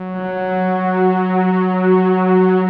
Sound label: rich mellow, Rich Pad; Optical Media International; Sonic Images Library